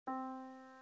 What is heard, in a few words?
yamaha Disk